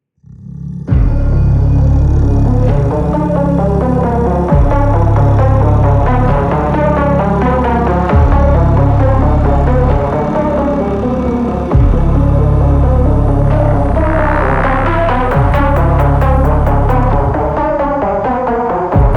Main Ambient; Doom Hunter Base - Main Ambient 04

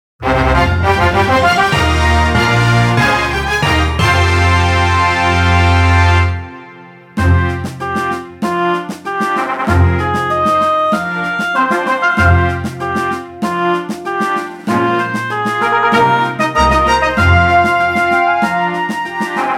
victory; culdcept revolt original soundtrack dynamix 2017

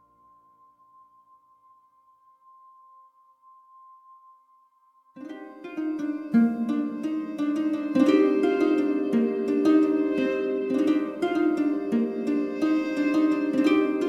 Town harp

